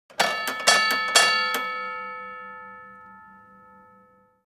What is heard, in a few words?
ringtone boxing bell sms